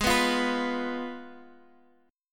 G sharp Minor 6th, Guitar Open F; strummed; 20,18,17,18,x,x; G#m6

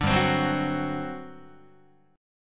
G Major 7th Suspended 4th, Guitar; GM7sus4; strummed

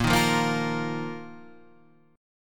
Bb Suspended 4th Bb down Guitar Standard; Bbsus4 strummed

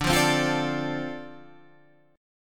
D-Minor 7th D Guitar Open G, chord

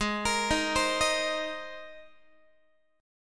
Ab Major Add 9th Ab Guitar; Abadd9; arpeggiated; x,16,13,13,16,14

